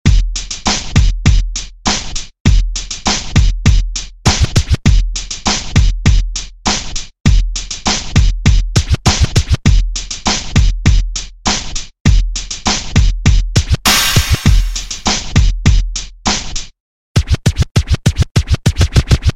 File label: Funky